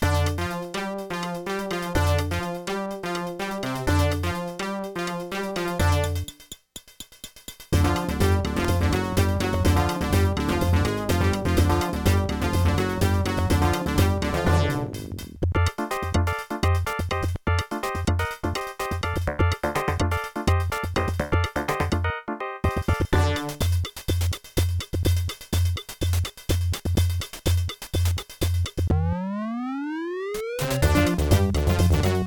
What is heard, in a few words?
level1 sb 20 ct1350b; Creative SoundBlaster 2.0 ct1350; soundcard test; Lost Vikings; Soundcard test page